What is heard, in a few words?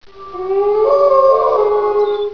pain